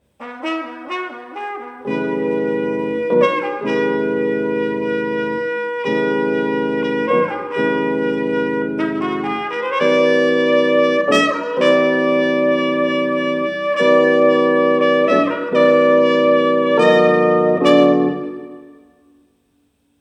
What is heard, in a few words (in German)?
Jingle Fender Rhodes